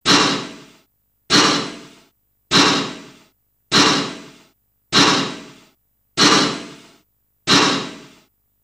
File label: Train Chuffs, steam train